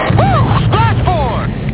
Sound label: Planes Exclamation; topgun